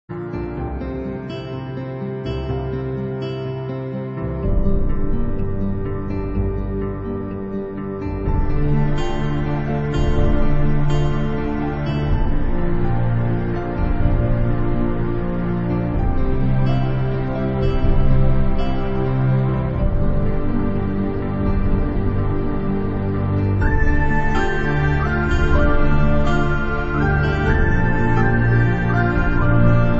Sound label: Orchestral